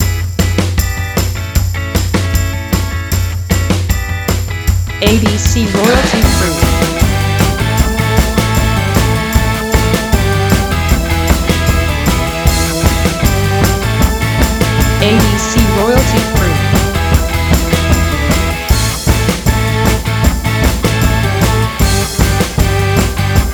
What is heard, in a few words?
Indie Rock Song